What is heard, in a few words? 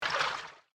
River Splash; my cute commissar 2020 gamerip